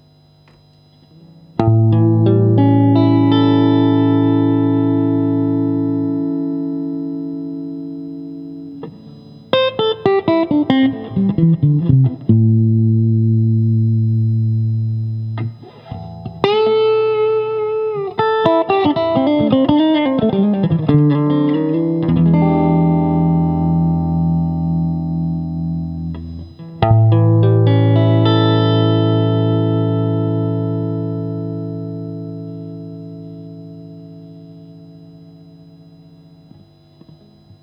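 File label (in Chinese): 60S MWS 美产铜线 清音 3档, acoustic guitar; kindman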